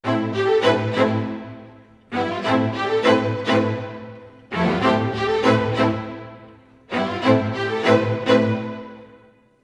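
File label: 90 A minor strings, Orchestral arrangements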